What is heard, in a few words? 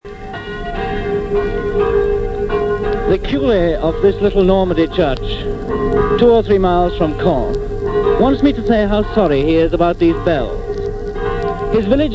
Churchbell Carillon; BBC On Churchbell Carillon In Normady; WWII News Broadcasts; 1944